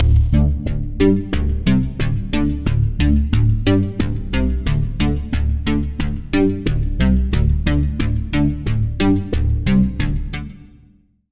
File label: Arp; Arp - Launch Countdown; Routed Sound; Serum 2 Expansion - Quantum Enforcer Vol.1